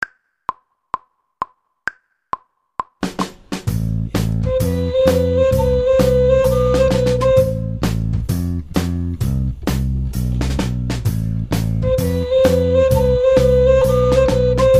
treble recorder

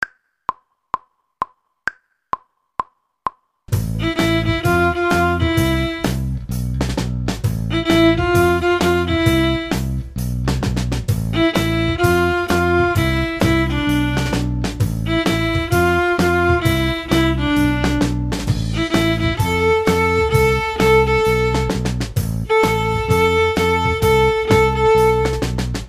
violin section

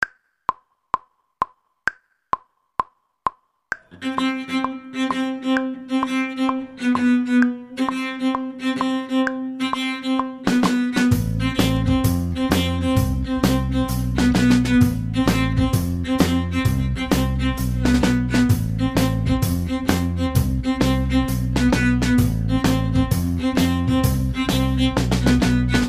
cello section

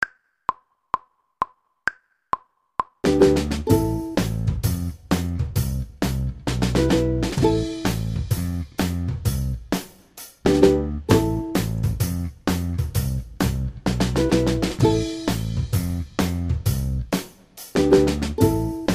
ukulele

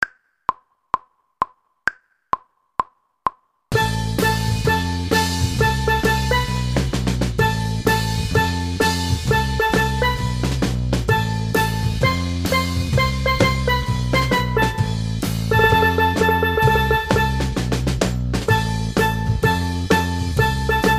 steel pans; steel pans 1

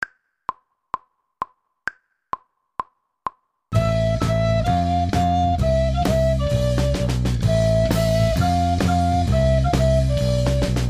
descant recorder

